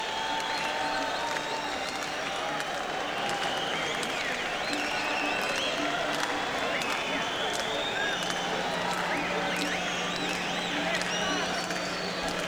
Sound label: Crowd Tuning; senn me80